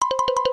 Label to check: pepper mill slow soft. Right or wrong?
Wrong